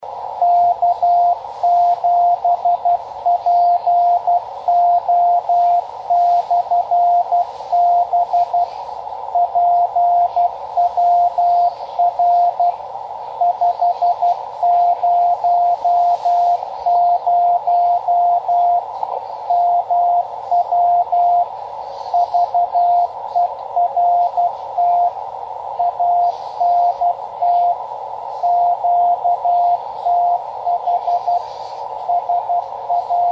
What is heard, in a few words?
half watt 10 meter beacon